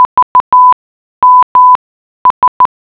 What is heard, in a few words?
morse code; VMS; flightsafety